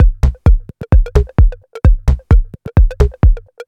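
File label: Drum loop 130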